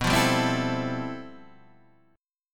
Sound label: G 13th B, chord